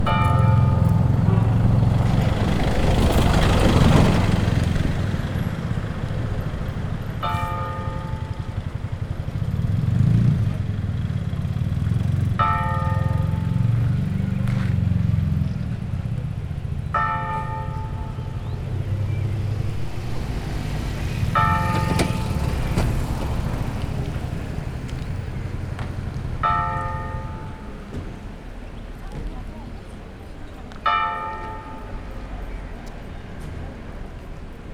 harangozás gyér járműforgalom

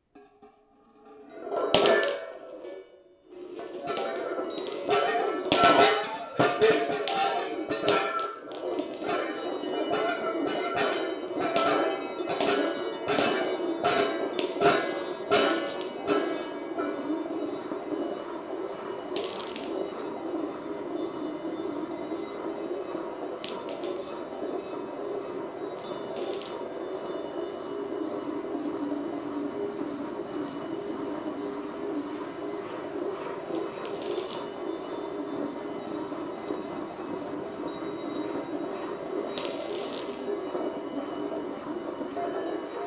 golyók keringtetése, golyók fémtálban keringtetése; Perkupa; hangkeltés

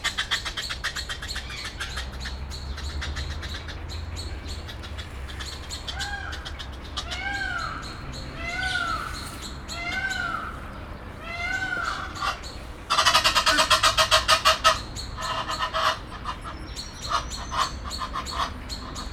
távolban páva is, nílusi lúd; kecskemeti zoo 2016

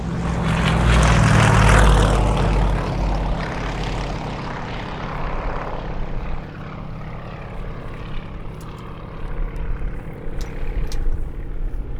kisse keson eszrevett elszallo gep, egymotoros repulo, repter; budaorsi repter

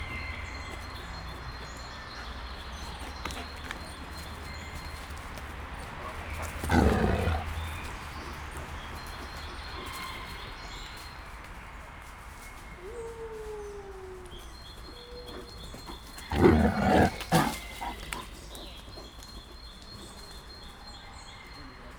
morog, kanadai feher farkas; nyiregyhazi zoo 2019

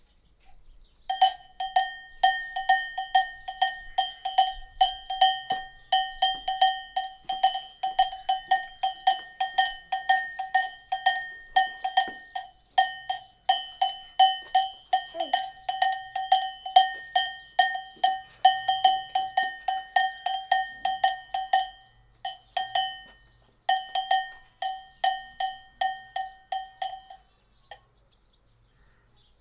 edelenyi, laszi tanya; kolomp

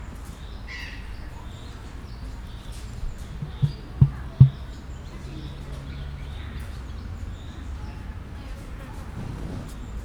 visszafogottan, emu; debreceni zoo 2019

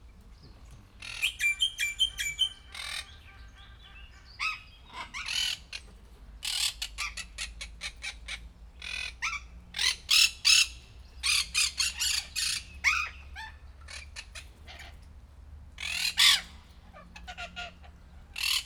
rozellaval kezdodik, rozellapapagaj; debreceni zoo 2019

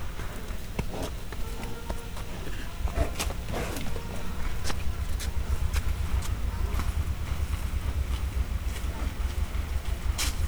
trombita aláfestés, bengáli tigris; miskolci zoo 2009

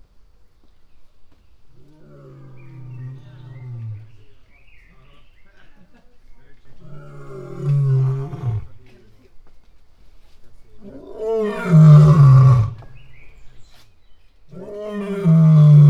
bömbölés, oroszlán; allathangok; veszpremizoo 2013

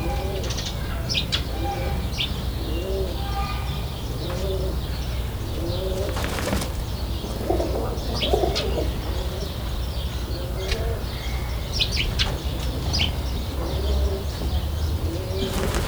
veréb csipogás is, debreceni pergőgalamb; debreceni zoo 2012; marantz puska

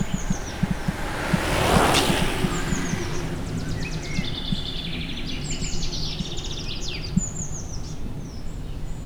madarcsicserges, lefele egyedul, zajok, kerekpar tura; visegrad

